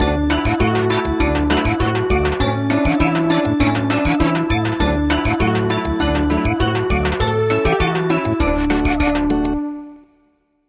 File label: Samba; traditional